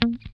GUI A3#, E-Gui Muted; Sound & Vision; Gigapack I CD 2

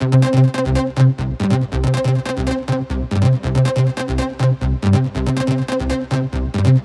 140BPM C; Vengeance - Essential Clubsounds Vol.4

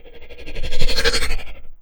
AIRPRESS, anime sound fx, comic

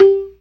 PERC, ORGAN; Keith Emerson Signature Series

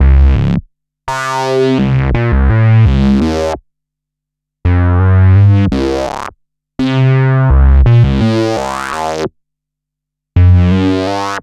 Pure Analog, Keyboards

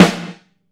LOW TUNE, SNARES; Sampleheads - New York City Drumworks VOL-1

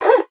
FRET, FINGER MUTE; Xsample VOL-1

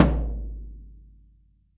DAV CENTER F, sticks; SoundBytes - Early Patches

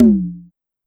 Conga Lo Down, Pitch Env; Clavia DDrum-AT; Pitch Env Various

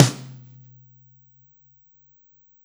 Snare Shard

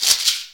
SHAKER, PERCUS KIT; AKAI

